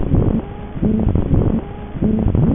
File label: piano, light to sound